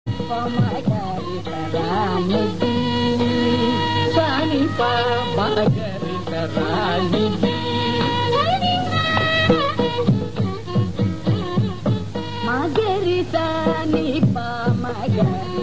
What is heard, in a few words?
manirangu swarakalpana; manodharma; raanidhi